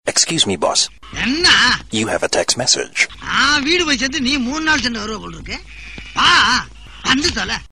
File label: Laughing; Vadivelu